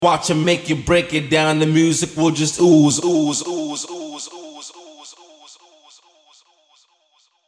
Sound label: Vocal Delay